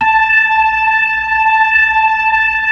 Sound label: B-3 Chorus